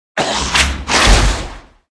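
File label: attack, monster; mutroop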